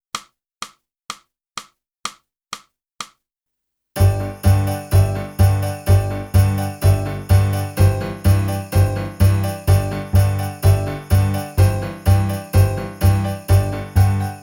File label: flute; boosey